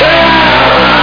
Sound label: ash dead; doom ash; Doom2 Explosion